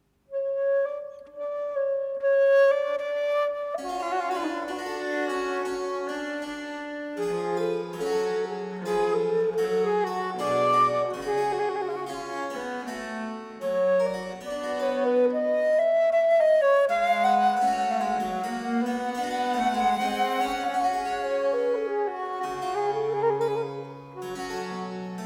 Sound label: sonata in g minor; sonata 3